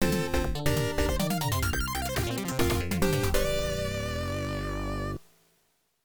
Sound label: Stage Clear; Metal Force 2; FMP Music Disk 3